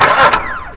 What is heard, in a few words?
bumper car spawn; tf2